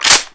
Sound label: scar bolt release; garrysmod